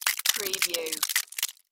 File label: Ice Crack; Sound Effects Bible